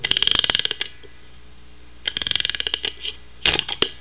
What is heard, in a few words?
windup